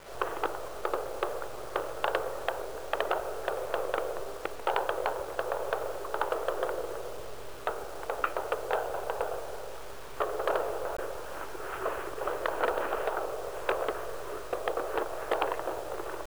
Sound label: background fire; ambience